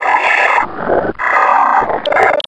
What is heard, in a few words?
zombine alert; scmod